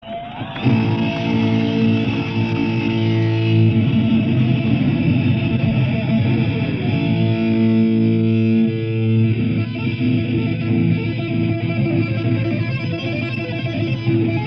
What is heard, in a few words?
guitar solo, live; 1987; kontsert v novorossiisk live